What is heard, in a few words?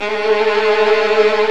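VLN TRILL, Maj Min Violin Trill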